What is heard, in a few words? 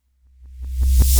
PERCUSSN, Ambient, Drum